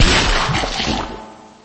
caida

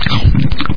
comer

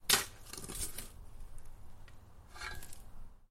cavar

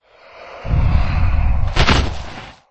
caida

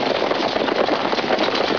caballos, animales

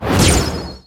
proyectil magico; evocacion

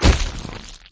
caida

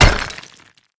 picar

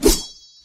ataque doble

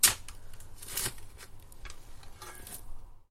cavar

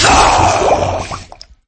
caida

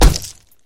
pelea

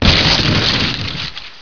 hojas, ruidos destruccion

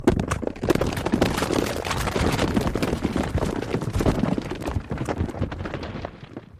picar peligro desprendimiento

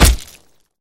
pelea

